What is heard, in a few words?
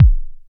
kick drum single hit d key, bass drum sound tuned to the D note